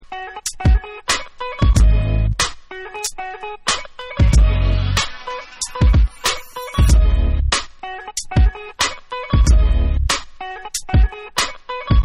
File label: Instrumental